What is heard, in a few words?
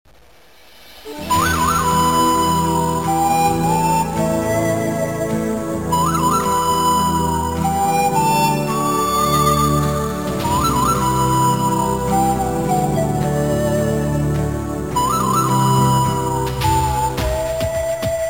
Instrument Cowboy